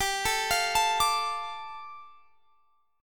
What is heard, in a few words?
G 7th Suspended 2nd, Guitar Standard; arpeggiated; JGuitar